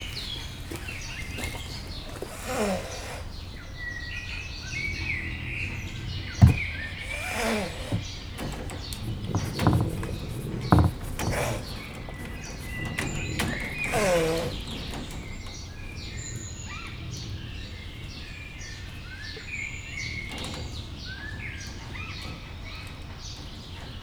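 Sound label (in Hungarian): fújtató cicus, mocsári hiúz; miskolci zoo 2018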